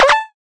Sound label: jump